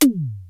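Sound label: electronic tom, electronic drums; Crypton - DrumBase5000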